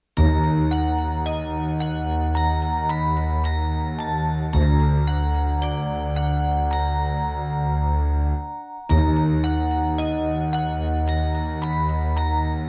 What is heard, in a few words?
Haunted Manor